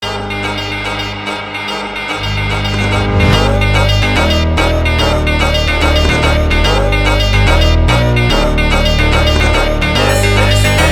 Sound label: speed up